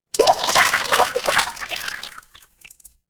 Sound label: water splash